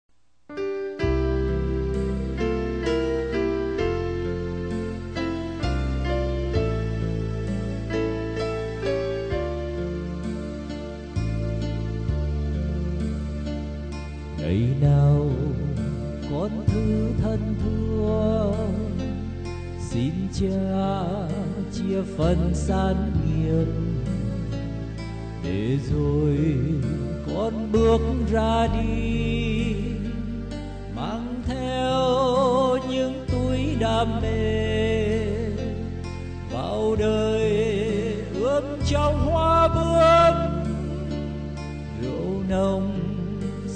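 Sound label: soprano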